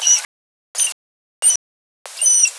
manatees

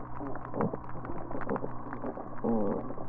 seatrout

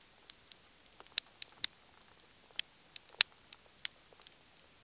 snapping shrimp

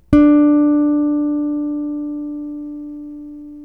Guitar D4

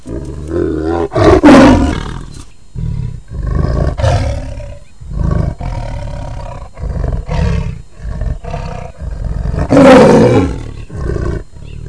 lion roar

